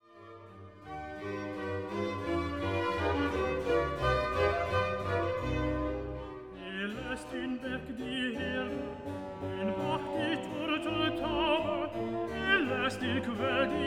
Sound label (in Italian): Recitativo e Terzetto